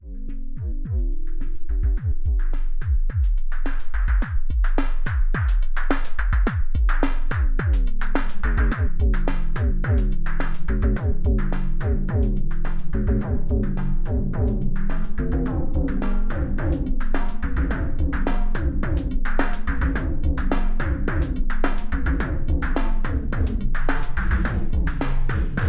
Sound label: Morphing Drum; Nord G2